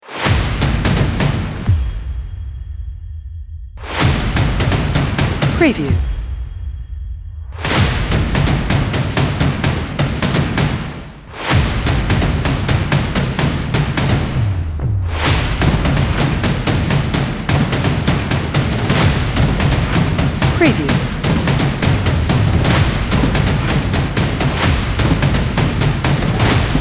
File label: Cinematic Action Drums Intro Teaser Trailer Fast Tempo